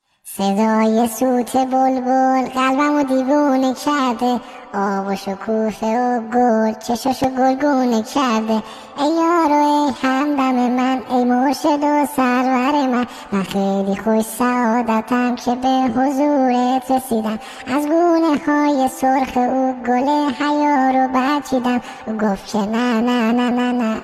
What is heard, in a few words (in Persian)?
Sedaye Soote Bolbol